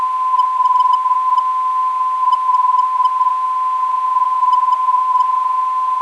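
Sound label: 357kHz, non-directional beacon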